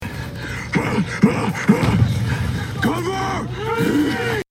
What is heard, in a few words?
grunt; punisher